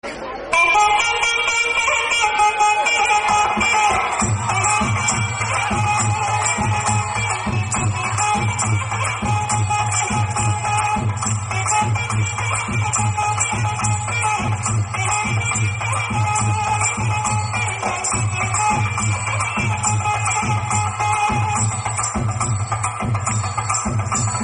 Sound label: soft instrumental music ringtone; bollywood